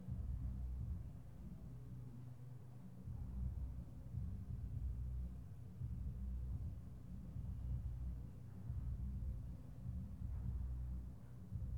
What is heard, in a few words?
Background Room Tone With Muffled Music And Bass